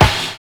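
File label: LIVE SAMBA; Univers Sons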